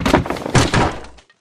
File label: sofa, Furniture